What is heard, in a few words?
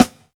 solid steel snare drum sound b key, snare one shot tuned to the B note